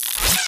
imp bullet energy bubbleshield; Titanfall 2

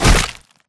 bar fight body impact; Titanfall 2

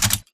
Mvmt FS Walk; AI Tick; Titanfall 2

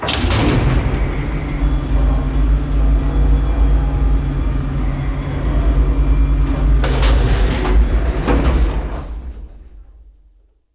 cavalry machinery; Titanfall 2

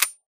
assault rifle dry fire; Titanfall 2

